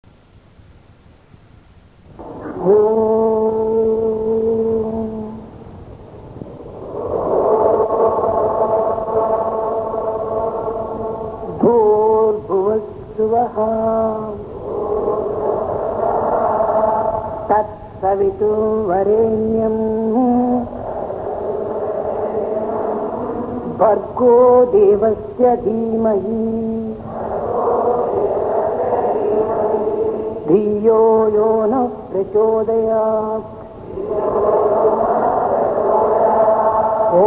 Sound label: gayatri mantra 3 times